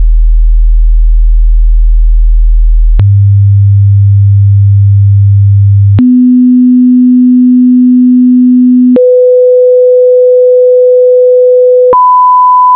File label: tones